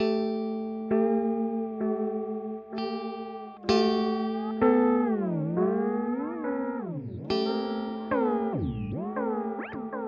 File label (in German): Chase Bliss Audio Thermae Step Intervalls